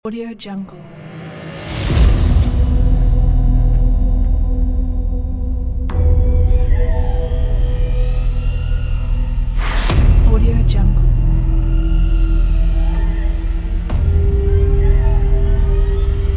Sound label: horror suspense trailer